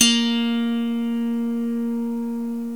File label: SWEDISH LY, Swedish Lyre; E-MU Producer Series Vol. 2 – More Studio Essentials; Composer